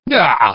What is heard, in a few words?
pain, construction